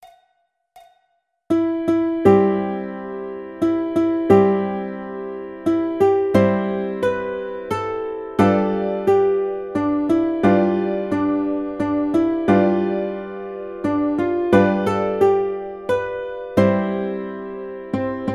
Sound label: Ukulele; Brahms Lullaby